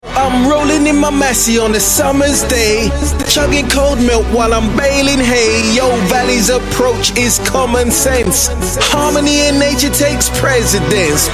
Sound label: rapping